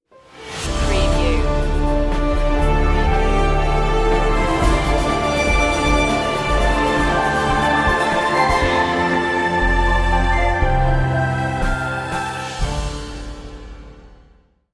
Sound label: winter glow